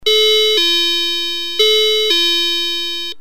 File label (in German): 2 Klang wiederholt; mistral 600